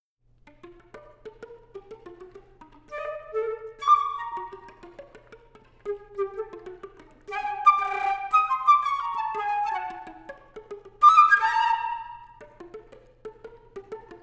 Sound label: Flute